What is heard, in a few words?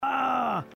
Uhhh